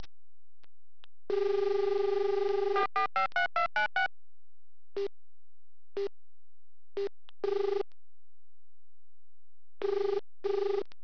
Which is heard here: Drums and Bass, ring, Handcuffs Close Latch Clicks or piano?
ring